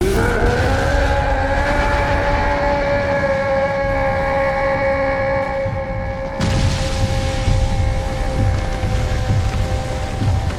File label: scream roar